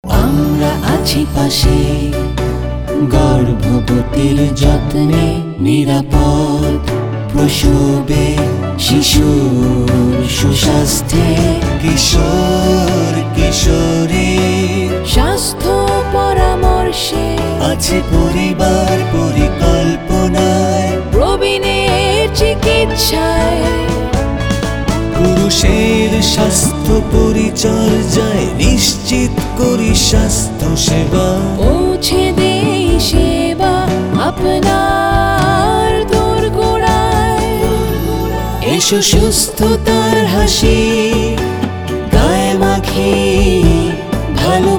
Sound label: Shurjer hasi Jingle